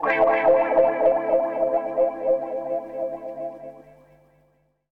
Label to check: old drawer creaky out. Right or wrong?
Wrong